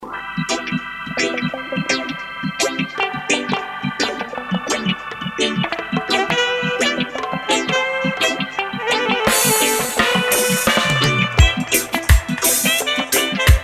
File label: Sax